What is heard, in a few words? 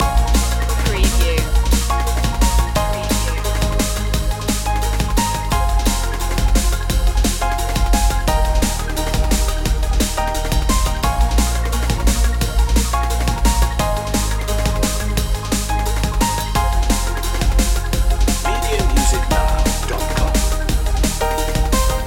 dub glide loop